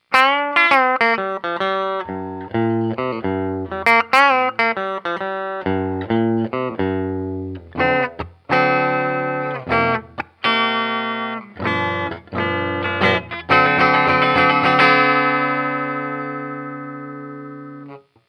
hifi HP Cabasse

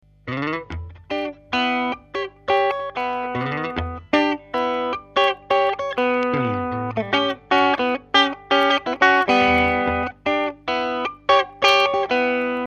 clean Ibanez; guitare; ampli 12AT7 + ECL82 SE

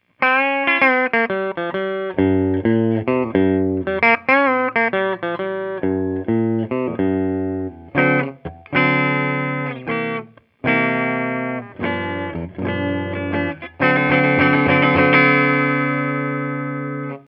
hifi HP legend

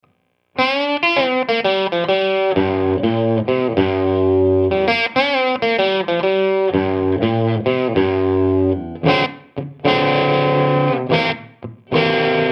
hifi 2SK30 non decoupl

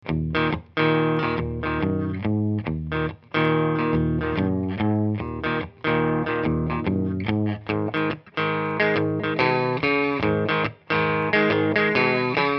crunch leger Telecaster; guitare; ampli 12AT7 + ECL82 SE

